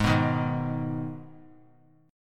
Ab Minor Ab down; Abm strummed; JGuitar

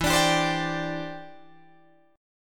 E Minor 7th Sharp 5th, down, Guitar, DADGAD; Em7#5; strummed

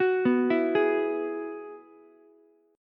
G sharp Minor 7th Sharp 5th, Ukulele; arpeggiated; 11,11,12,11

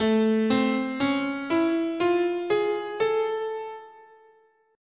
A Augmented, scale

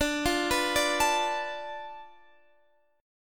B Minor 7th Flat 5th D, guitar chord

